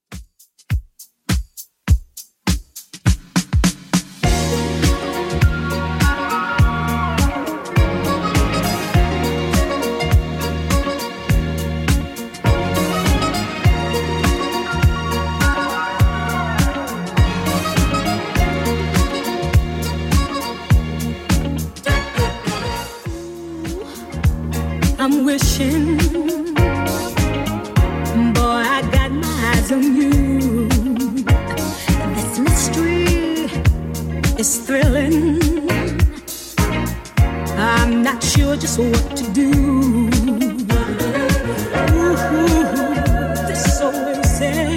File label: 70s Redrum 102bpm